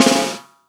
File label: Snare Roll